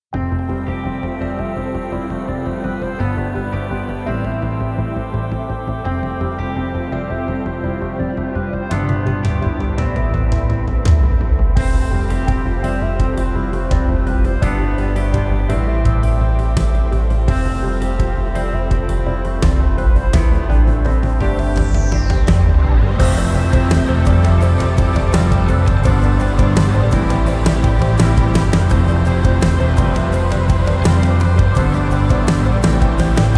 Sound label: gospel; gospel drum kit ii; polarity audio